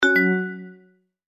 UI Leave

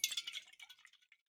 Impact Flask

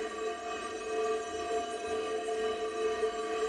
Magic Rope Loop

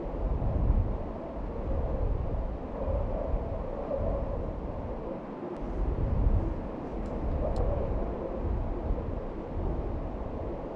calm winds; A Difficult Game About Climbing

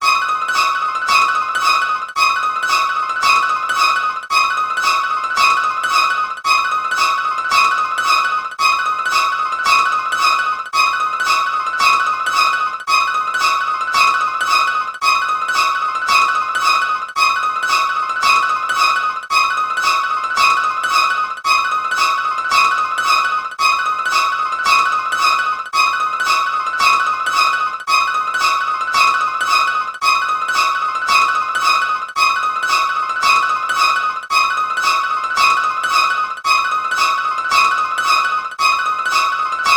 Tension; beyond good evil

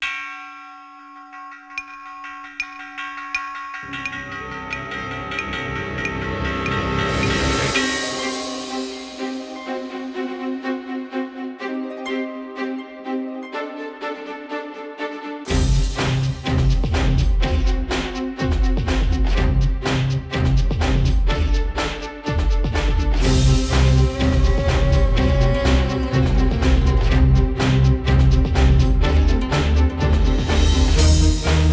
Enemy Attack; Rune Factory Guardians of Azuma

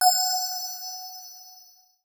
SFX - Menu Background Select; uno

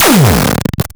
Fx Impact; Fx Impact Zone

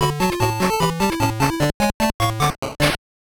Jingle Minigame Intro Card; Doraemon Waku Waku Pocket Paradise; Game Gear

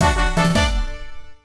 Jingle Title; Castle Smasher

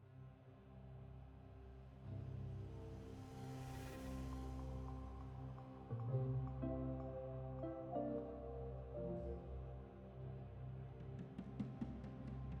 Smallroom Loop; Elroy and the Aliens

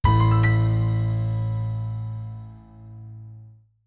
frustrate (chord); Pangya Portable Original Sound Track 2008

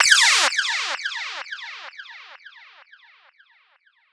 Fx Laser Fire

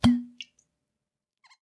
Impact Flask

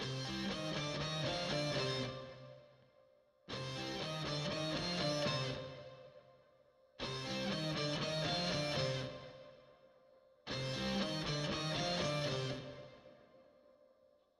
Combat Threat 120 Cm; Combat E- Tower3 Threat4 120 Cm 78 2

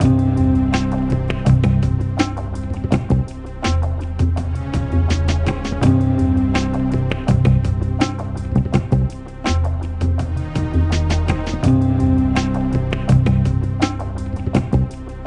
Level Failed; Urban Chaos; PS1

